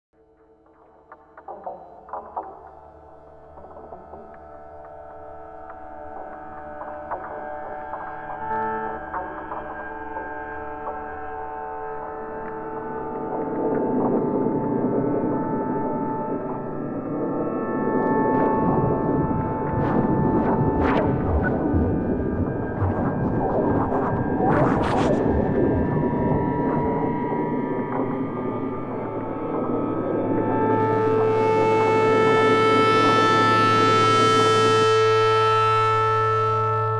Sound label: drones and sounds